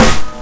Snare, C#2; Guitar Rock Tour

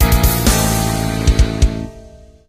Training Failed; Entaz Baseball 2011; Android

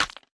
Weapon Bone Big Stinger

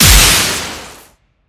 Sp Gatling Generate; Se Sp Gatling Generate

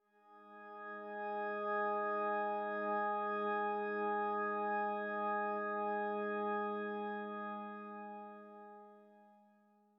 Church Organ; Grindstone

